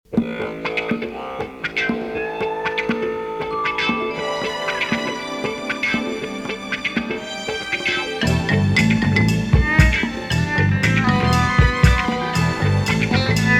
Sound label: disco